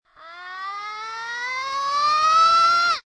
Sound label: ahh in japan